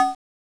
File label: Saw F2